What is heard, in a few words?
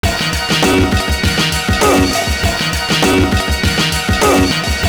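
ORGANUS, 100 bpm; Univers Sons - Kitch 70s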